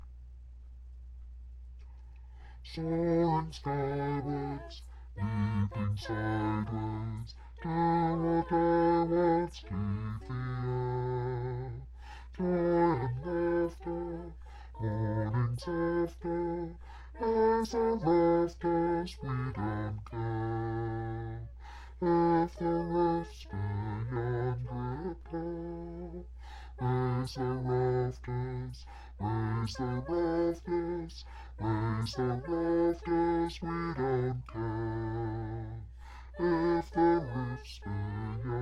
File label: unison low male register; unison in harmony